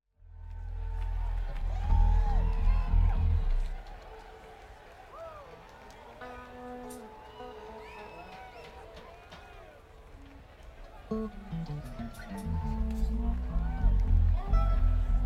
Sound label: Crowd Tuning